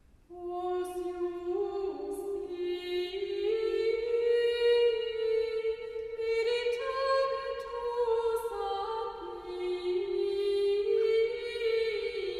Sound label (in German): Vokal